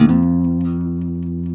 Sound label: F#2A, Guitars; Spectrasonics; Hans Zimmer Guitars VOL-1; MARTIN HAMMR